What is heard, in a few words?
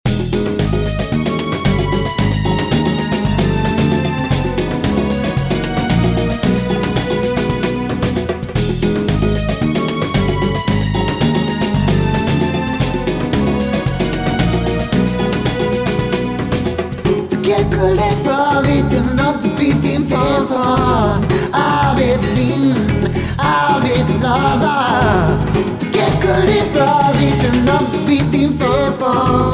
Hit Latino